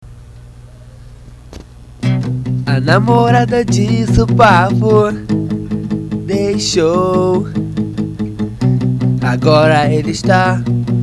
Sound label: emo